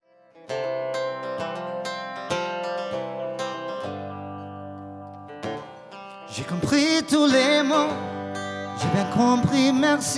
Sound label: French Band